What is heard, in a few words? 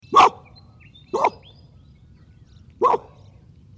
animal dog blue healer bark